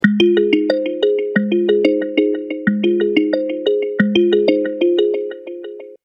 Android M Triton SMS

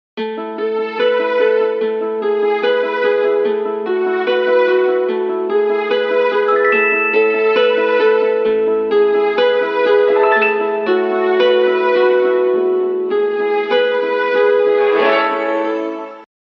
Bell Happy New Year

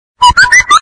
whatsapp alien

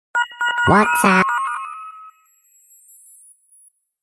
voiced chime

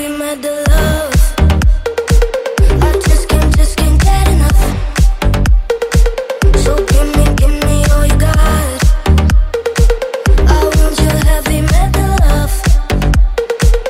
Heavy Metal

